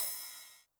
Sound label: ride cymbal High, 909; classic machines; 909mod; RC - RIDE CYMBAL